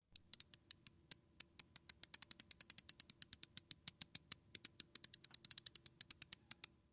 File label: Fishing Reel Reels In, fishing pole